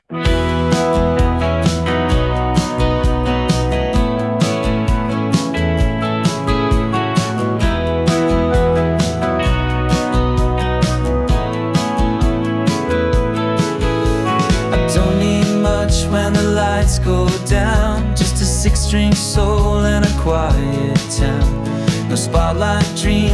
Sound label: jangle pop, Me and My Guitar